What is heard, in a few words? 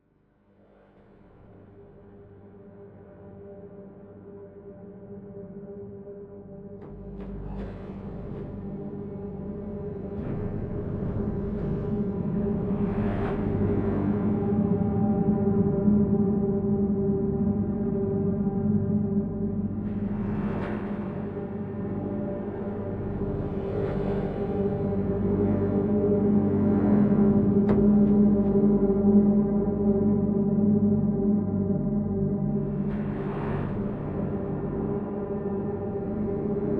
Material Atmosphere C